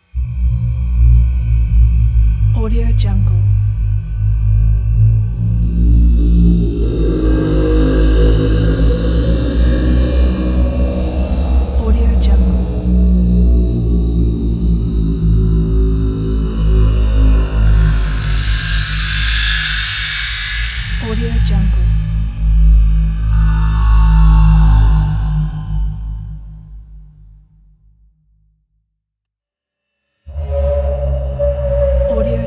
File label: atmospheric horror sub bass; frostly